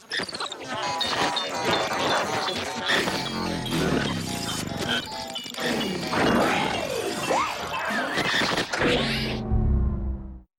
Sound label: Major error - Shutdown